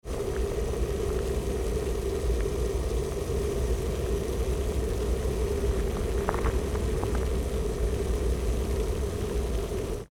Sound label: Electric kettle boiling water; appliances